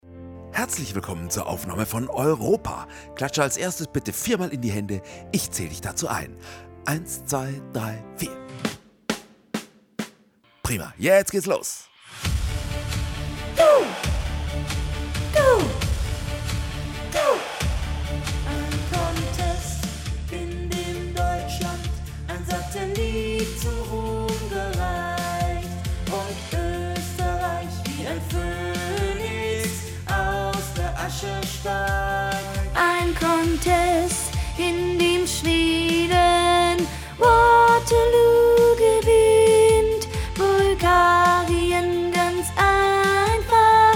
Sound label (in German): Playback fuer Video - Sopran